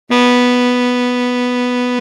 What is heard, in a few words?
Bass Sax Solo